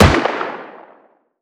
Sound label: mid, shot rocket; ACE3; Arma3; CookoffSounds